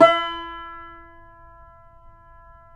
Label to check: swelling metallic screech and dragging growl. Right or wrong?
Wrong